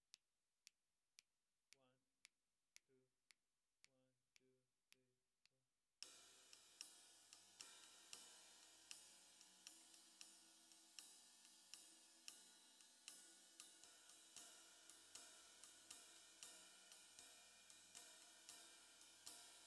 SNR

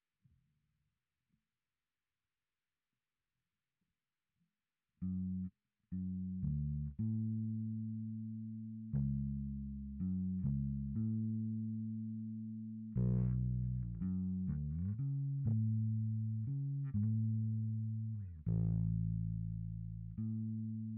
Bass Sansamp

